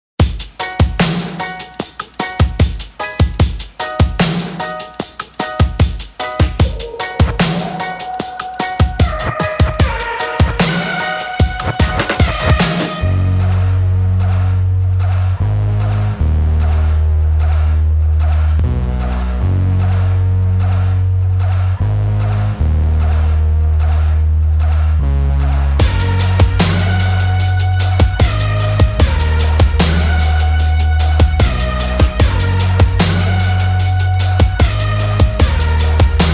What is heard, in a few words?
Instrumental